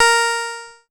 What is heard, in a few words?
A#, PIANO; Big Fish Audio; Synclav FM